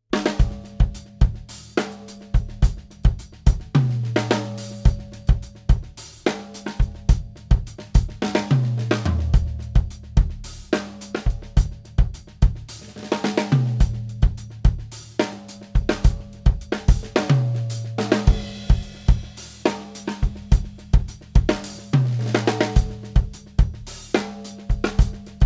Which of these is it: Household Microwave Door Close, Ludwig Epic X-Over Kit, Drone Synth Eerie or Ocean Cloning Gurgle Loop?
Ludwig Epic X-Over Kit